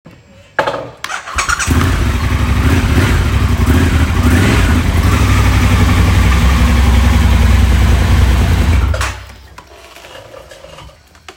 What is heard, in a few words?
Scrambler soundbite, triumph scrambler 1200